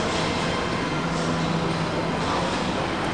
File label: signal